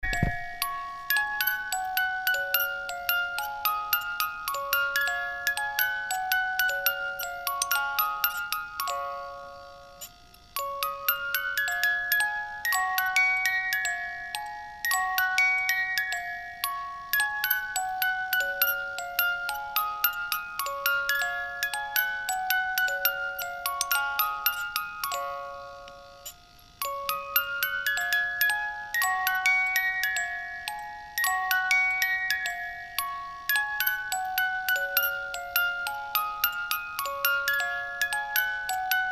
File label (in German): Spieluhren; fuchs du hast die gans gestohlen